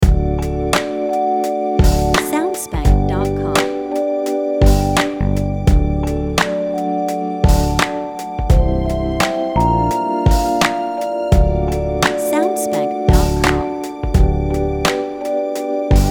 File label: Neutral Pop Beat Lite Loop Version